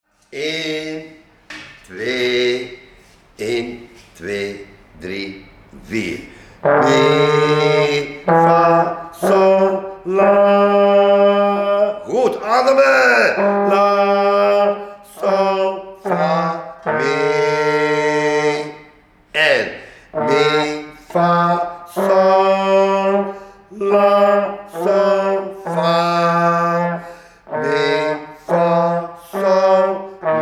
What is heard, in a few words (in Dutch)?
fanfare